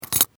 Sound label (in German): office Ordnerverschluss